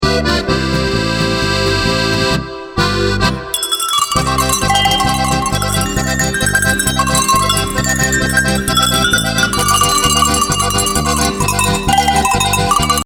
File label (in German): Glocken